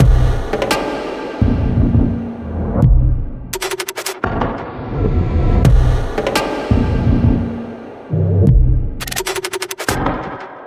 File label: Drum 85; Brigads